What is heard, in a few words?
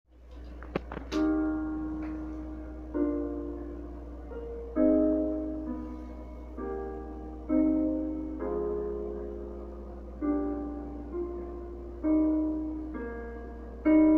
soprano solo; faure